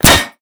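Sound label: bullet head; boneyard; Call of Duty Modern Warfare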